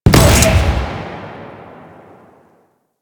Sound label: Missile Barrage Fire; Enemies; Weapons; Warframe; Corpus; Arachnoid Camper